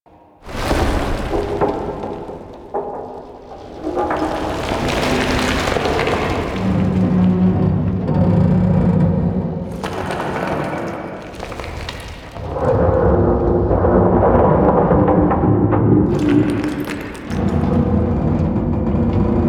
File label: Exterior Rock Debris Medium Loop; Warframe; Ambience; Duviri